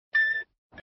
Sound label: beep